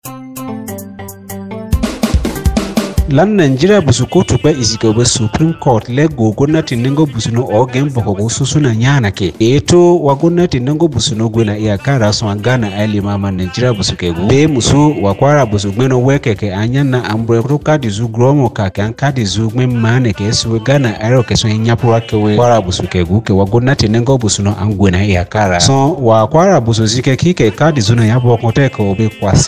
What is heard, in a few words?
Election Jingle BOKOBARU